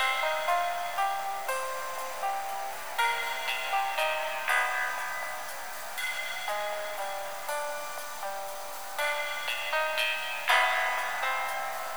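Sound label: elevator music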